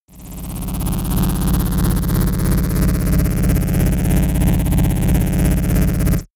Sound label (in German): hover car, raumschiff